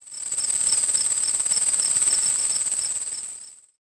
crickets; ambient; World of Tanks Blitz; rudniki

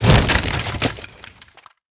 wood box mid; World of Tanks Blitz

